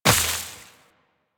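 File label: Step Vegetation, Footsteps, Walk; Warframe; Tenno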